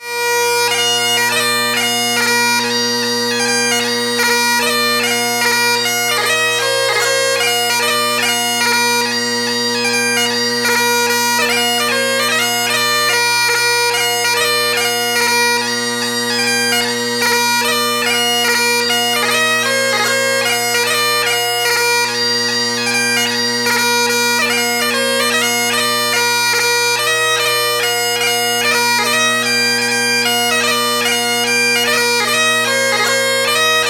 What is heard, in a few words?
Tradtional Scottish, bagpiper